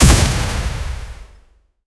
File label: armored divine spear thro; deep impact